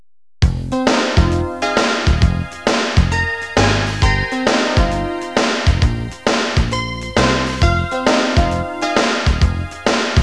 suonerie businessy

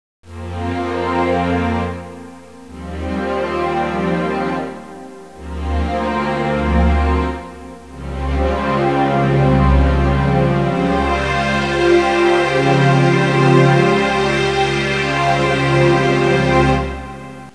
audio suonerie musica mistica

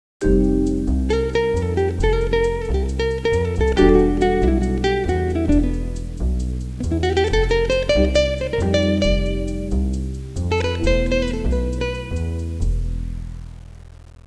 suonerie musica jazz

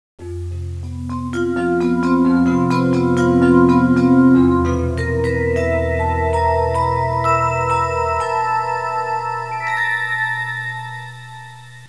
audio suonerie musica mistica

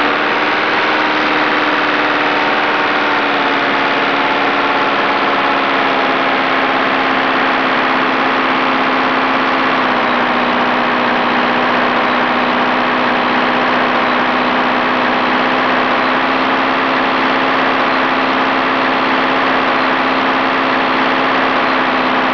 Aereo; trasporti; aerei

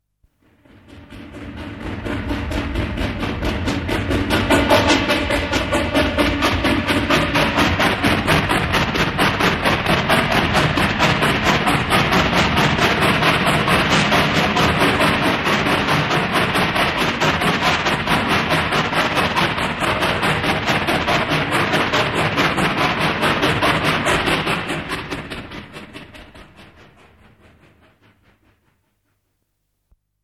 TRENO A VAPORE ARRIVO; trasporti; treni

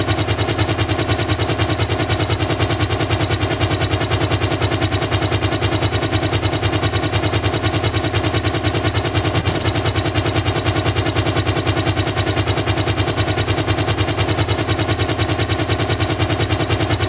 Elicottero; trasporti

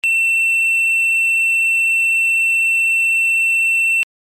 suoneria beep

